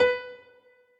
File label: piano